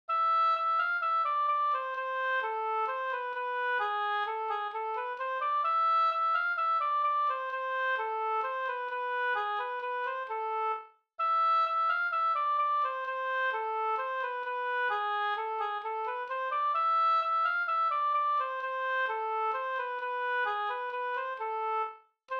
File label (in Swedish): hambopolska amoll